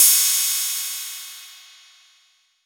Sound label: CYM